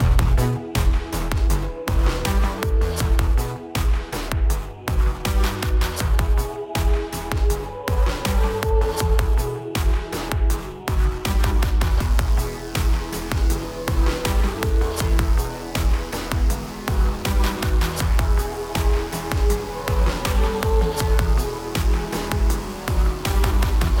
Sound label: Mix Sidechains; acustica audio dove